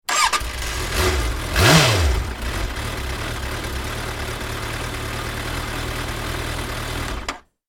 transportation motorcycle start rev off engine perspective suzuki gsx650f; Motorcycle Start, Rev Then Switch Off; Vehicles; McKinney Sound